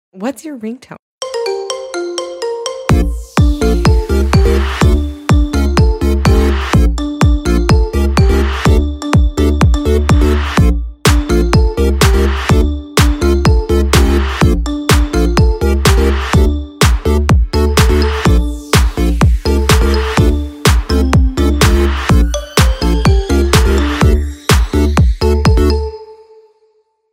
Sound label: energetic ringtone